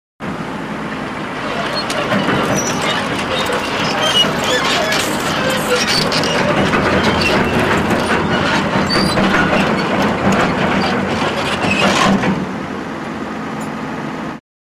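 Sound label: Farm Excavator Tractor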